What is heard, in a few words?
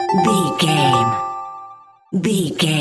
jingle chime positive